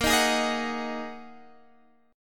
A sharp 7th Suspended 4th Sharp 5th, Guitar Drop D chord; x,13,13,13,16,14